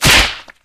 slap hit; tf

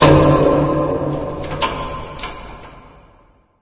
mall random; ambient; Left 4 Dead 2; Source Engine; random amb sfx

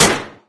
sentry upgrading, weapons; tf

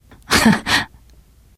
laughter, teengirl; source engine; left 4 dead 2

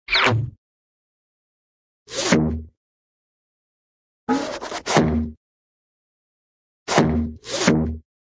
thump, cartoon heavy thump

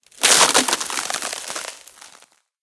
icicle freeze victim; tf

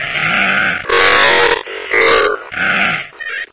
zombine ready grenade; npc; source engine; episodic

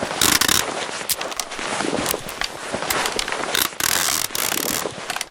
bandaging, survivor heal; source engine; left 4 dead 2

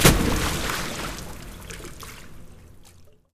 panel splash water; Source Engine; Portal 2

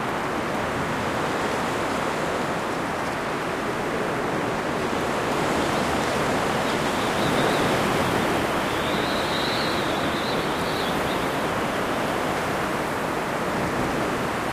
perimeter ocean distant; Source Engine; tf; lair

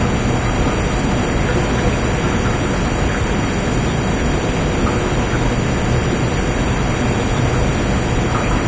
pump room ambience loop; video game; Left 4 Dead 2; Source Engine; ambient

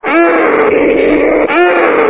fast zombie frenzy; Source Engine; video game; npc

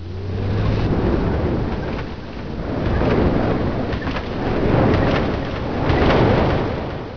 fan on, fans; Source Engine; bshift

